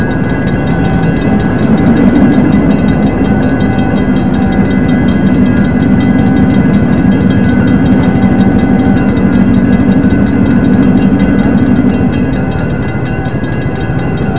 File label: 2 trains n hello